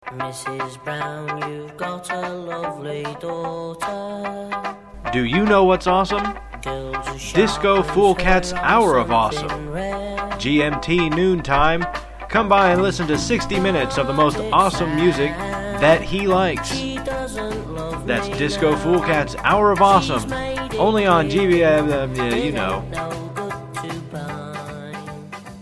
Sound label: promo disco